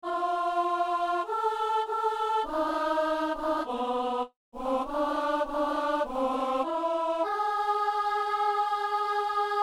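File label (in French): toi qui nous aimes écoute nous seigneur, alto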